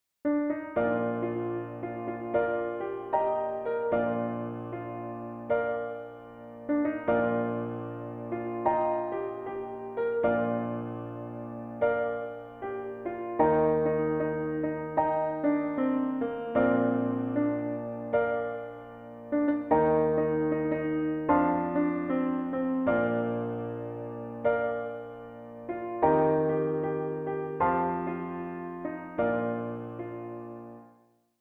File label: piano in Bb